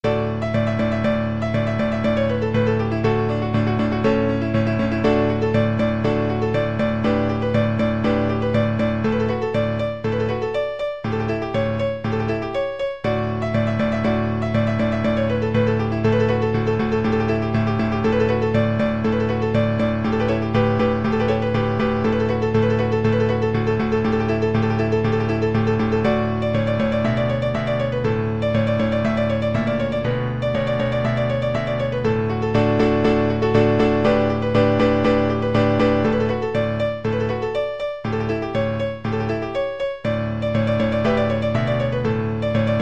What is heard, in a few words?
Capriccio Espagnol piano